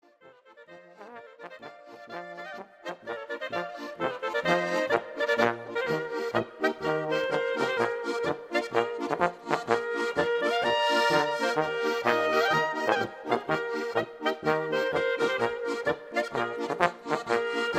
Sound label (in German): Polka